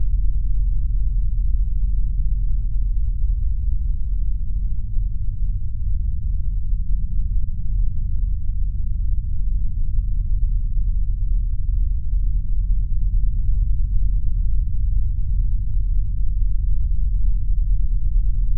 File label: Drone Subsonic Power Source; Production Element Imaging; AdobeCC